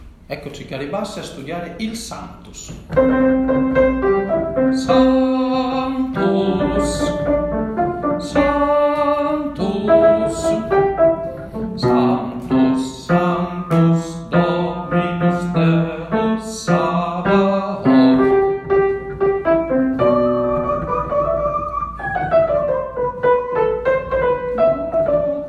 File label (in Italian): Sanctus Bassi; Santucci; Messa del Nonno